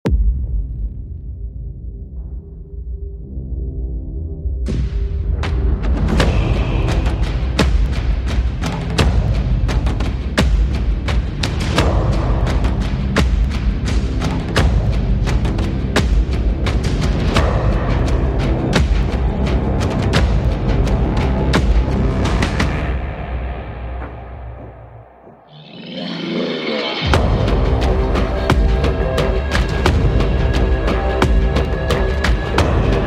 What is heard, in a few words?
War Drums